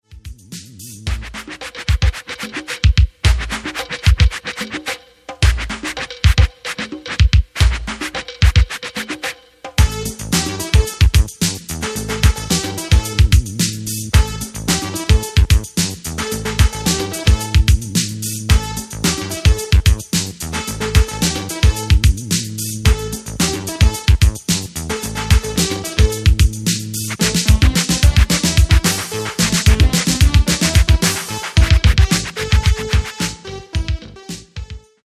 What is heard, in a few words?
Sine Rotation